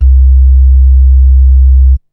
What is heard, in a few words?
BASS; SoundFactory; SMPMANIA